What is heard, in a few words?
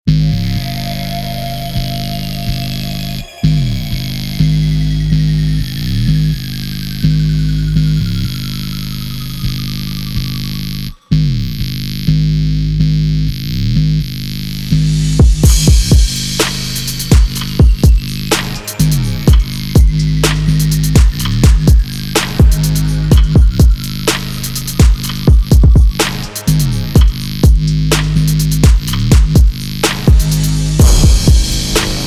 Type Beat